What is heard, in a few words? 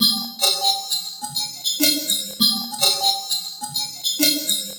Beat, Concrete Beats, Electronica